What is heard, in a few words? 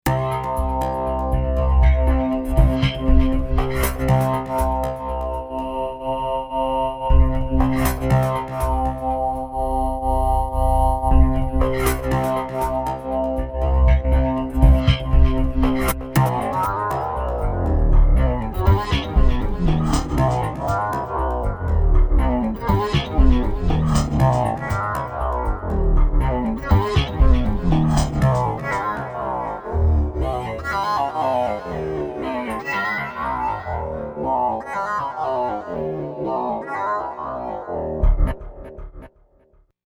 synth outsider